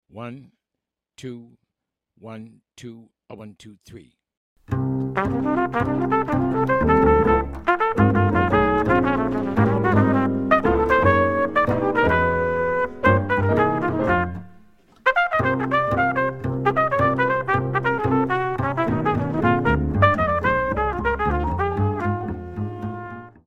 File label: minus drums; count off sets up the intro; play figures and comp for the intro and melody; comp for the trumpet solo 2 choruses